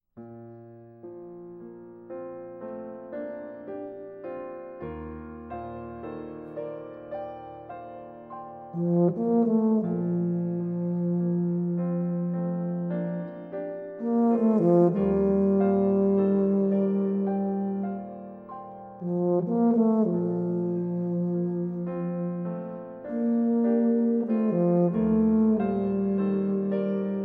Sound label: Tuba and Piano, Cancion Sin Palabras